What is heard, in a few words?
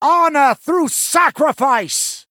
Male Human Attack